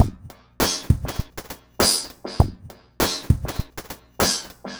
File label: Remixed Drums 100BPM; The Roots Drumloops